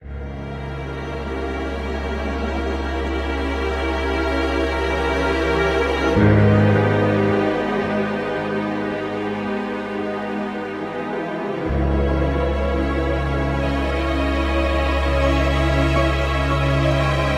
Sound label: Cinematic Approaching the Void; Last Encounter